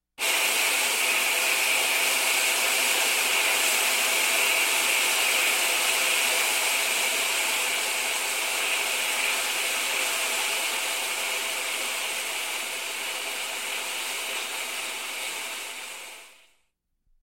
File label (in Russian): zvuk intensivnogo isparenija